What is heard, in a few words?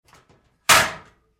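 Door Locker Open close-up Med, Metal Door; Metal Doors; Big Room Sound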